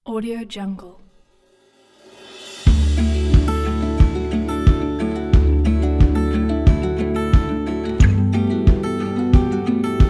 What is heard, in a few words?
acoustic indie folk pack